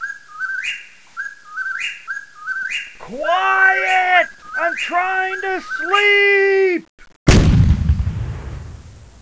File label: whippoorwill